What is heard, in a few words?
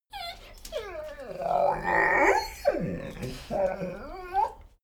dogo argentino whine sequence; natur-tiere; hunde